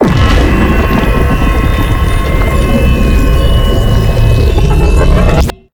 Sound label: beam level active; smite; god atlas